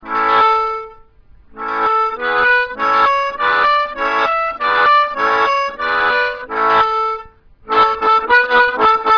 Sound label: Slap, diatonic